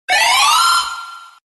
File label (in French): buzzer; questions pour un champion